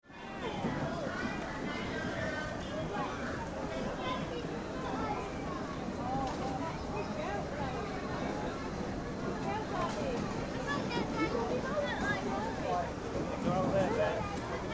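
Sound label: Theme Park Atmosphere - Various activity background; Theme Park Atmosphere 1 - Various activity background - kids voices - open air kids park; Amusement Park; Tom Hutchings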